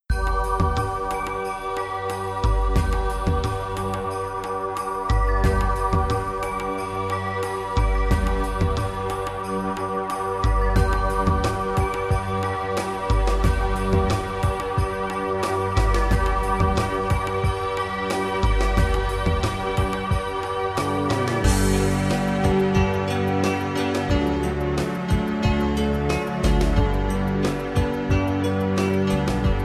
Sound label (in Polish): muzyka do masazu relaks ciala 432 hz